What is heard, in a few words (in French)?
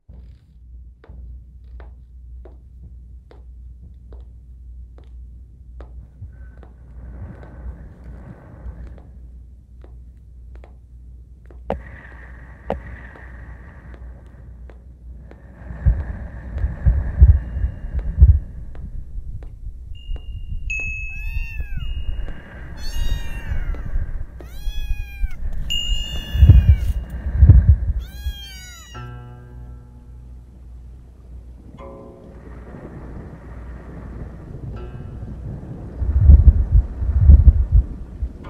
son pieds bandes